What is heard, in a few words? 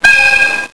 Whistle DE10